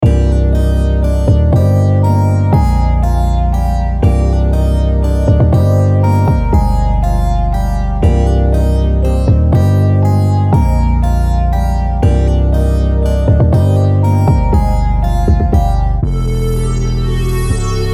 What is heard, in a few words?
loops